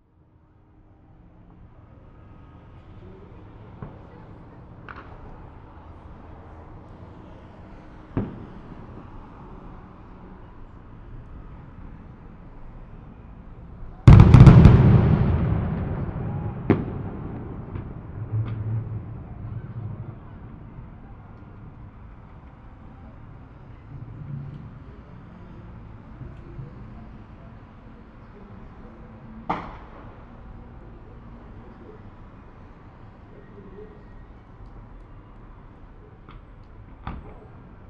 fireworks tenerife

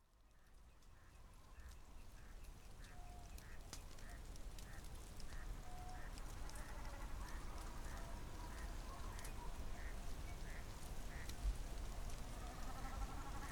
Whooper Swan

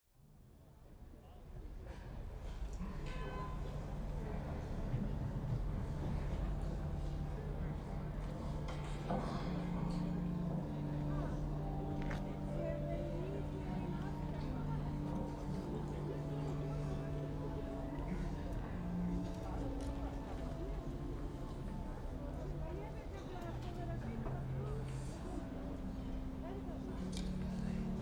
state opening, parliament

